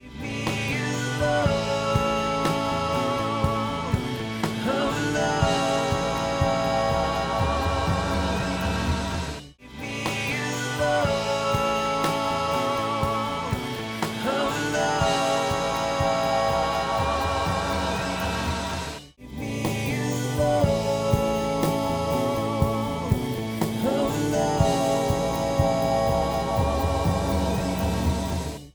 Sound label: BussTube buss; airwindows